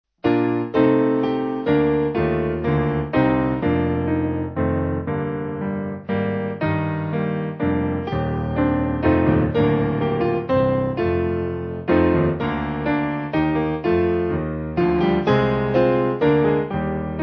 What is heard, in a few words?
Piano